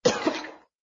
Gag; Lewd SFX

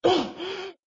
Cough; Lewd SFX

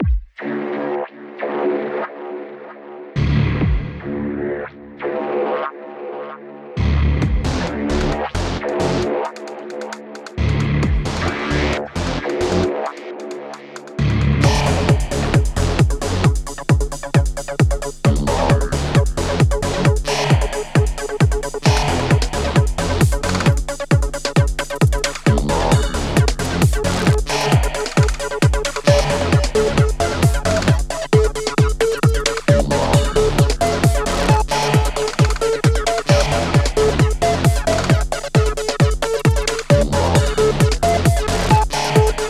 heavy duty

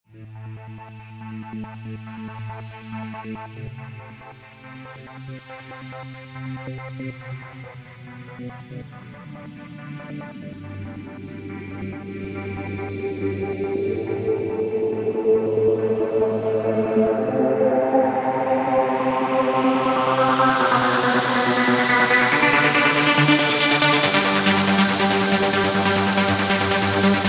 Violin Melody; Forest

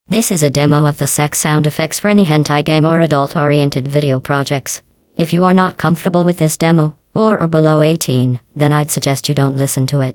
sex sfx

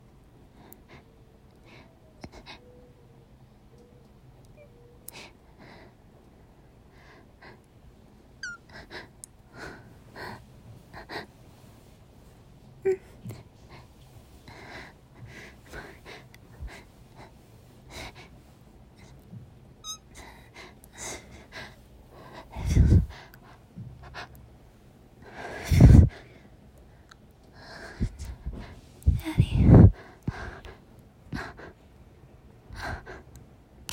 heheh

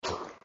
Suck; Lewd SFX

